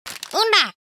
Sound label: Hurt SFX; chiikawa-big-event; ChiikawavsChimera; usagi